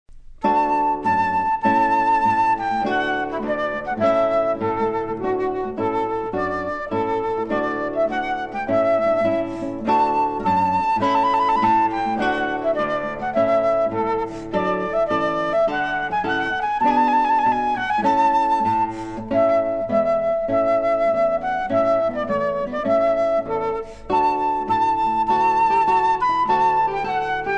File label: Trumpet Tune Duo